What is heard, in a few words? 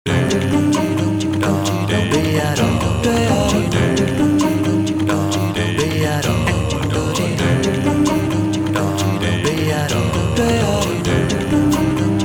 Vocal Orchestration